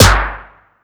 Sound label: 808 Snare Pitch Down; Slate; Vintage Drum Machines Volume 2 808 Edition